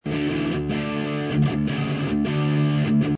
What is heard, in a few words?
Brit Stack Drop D; Loopology